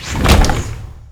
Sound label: door heavy open